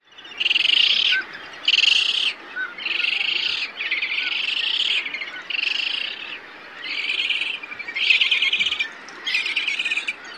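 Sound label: hoarse chittering, Parakeet Auklet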